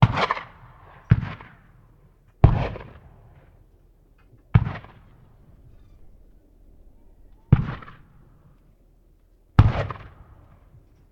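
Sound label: Cannon Black Powder; Sound Ideas; Guns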